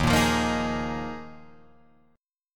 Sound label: Eb Suspended 2nd Suspended 4th, Guitar, Drop D